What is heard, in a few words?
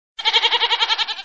Sheep